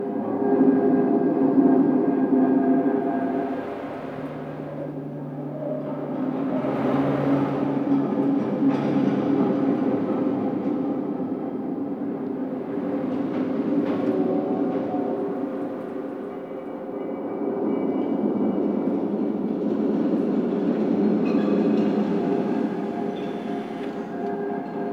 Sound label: Dojo Interior Amb Loop; Ambience; Warframe; Mod Quest; Teshin's Hideout